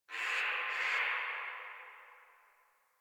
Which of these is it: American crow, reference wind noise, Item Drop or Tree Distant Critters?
Tree Distant Critters